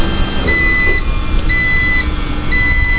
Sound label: beeps